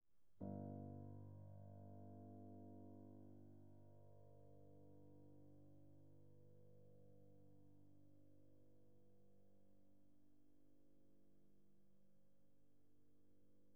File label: Piano pp G1